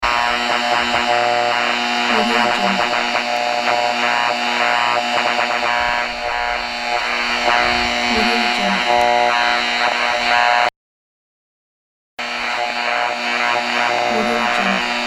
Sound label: electric static